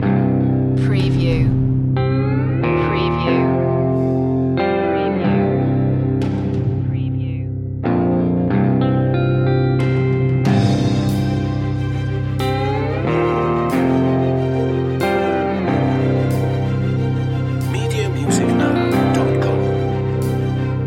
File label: lone wolf loop